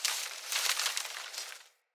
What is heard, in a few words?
Tree Pine Branch Break; Midrath Island; Ambience